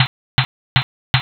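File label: footsteps asphalt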